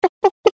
chicken

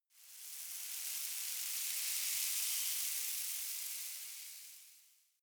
desert oneshot sand

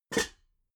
pots stack soft

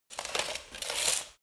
light walk single

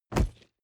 nylon leather bodydrop hard

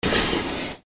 pneumatic blast

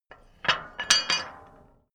metal gate bolt

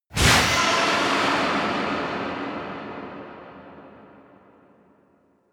whoosh scifi lash

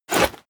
blade sheathe dull

